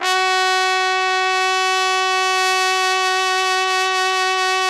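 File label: TPT, trumpet; Roland - LA Composer vol. 3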